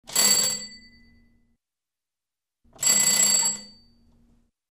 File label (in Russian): zvon starogo zvonka